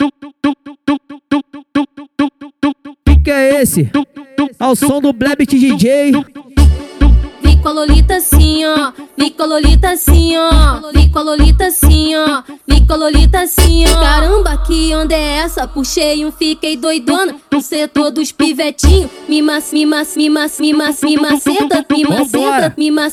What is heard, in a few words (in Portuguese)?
funk sertanejo